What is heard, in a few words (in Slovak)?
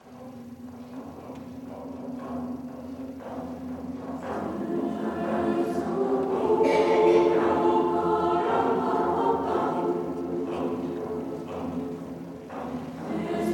Zvuk bubnov